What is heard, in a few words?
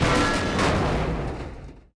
objects, World of Tanks